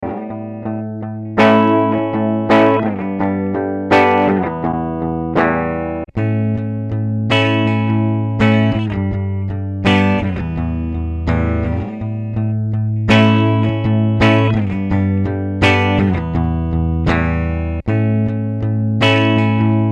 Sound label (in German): Boss EQ 200 Mid Scoop Verzerrt; Post EQ